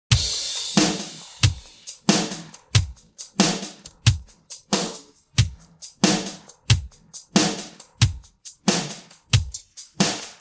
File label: pattern, drums